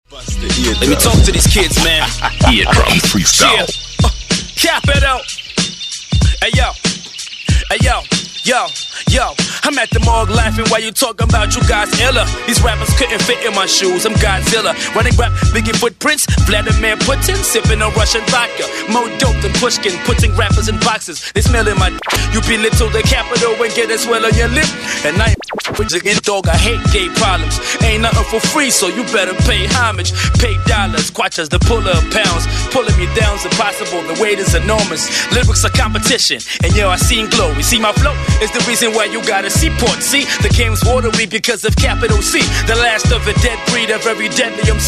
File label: Freestyle